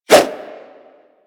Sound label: Nunchaku Whoosh, Weapons; Warframe; Tenno